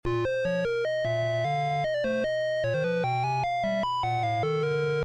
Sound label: Game Over Song; Moonsweeper